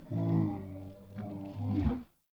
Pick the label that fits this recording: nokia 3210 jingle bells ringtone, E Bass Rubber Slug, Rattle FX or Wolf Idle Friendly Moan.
Wolf Idle Friendly Moan